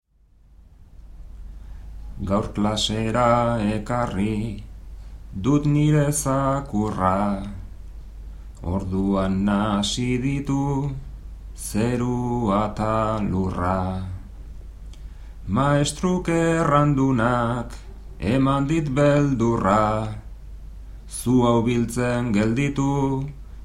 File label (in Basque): bertsos